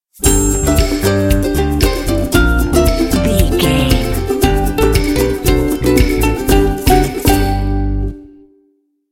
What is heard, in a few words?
happy ukulele stinger